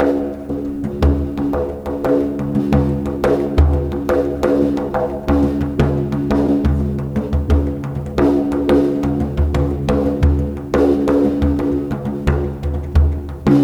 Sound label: bodhrán 88 bpm